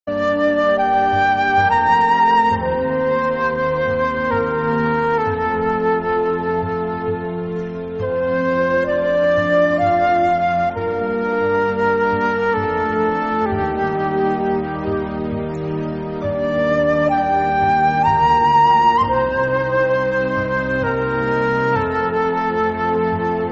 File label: theme piano ringtone; gladiator